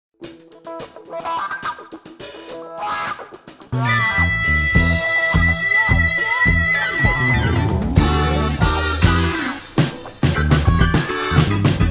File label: 70s funk, Windows Mobile ringtones; Microsoft; Individual download-only (2009)